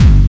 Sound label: hard bass drumz